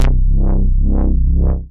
Wavebass; Basses; Ableton; Creative Extensions

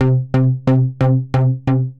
Detune Bass Pulse; Ableton; Mood Reel; Tense Pulses

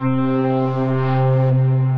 Interspacial Pad, Synth Pad; Ableton; Factory Packs; Synth Essentials

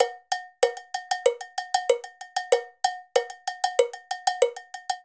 Guaguanco 2 Cowbell 190bpm; Latin Percussion; Ableton; Factory Packs

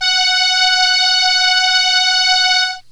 Solina Trumpet F#4; Analog Synths; Ableton Foundation FreePacks

